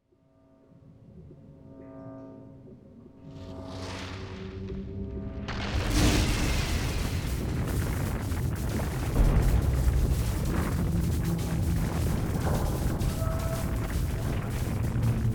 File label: Cutscenes Fx Stem